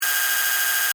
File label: Data Readout, Modulated, Scifi; Science, Fiction; Tech; BlastWave